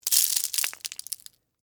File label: Texture, Debris, Spill, Sugar, Cone, Waffle